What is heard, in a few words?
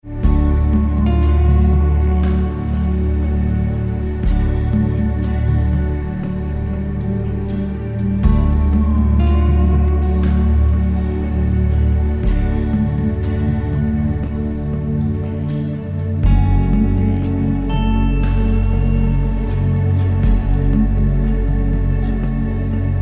pure music